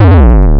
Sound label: wound; mother2